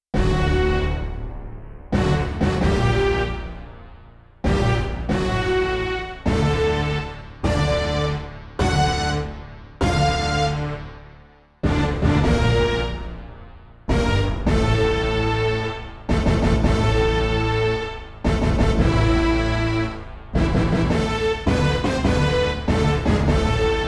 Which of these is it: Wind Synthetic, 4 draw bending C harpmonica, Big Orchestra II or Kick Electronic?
Big Orchestra II